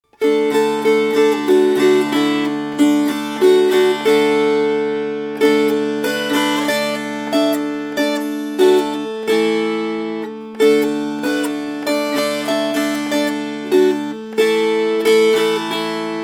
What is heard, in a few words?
When You Go A-Courtin Strummed